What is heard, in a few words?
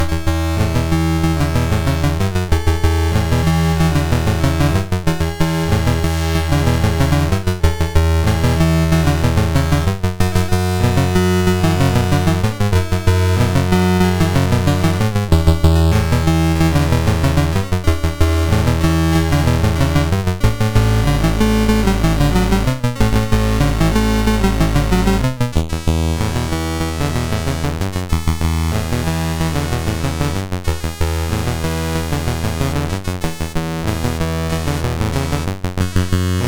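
Magicians Ball, MOS 6581 SID chip music, games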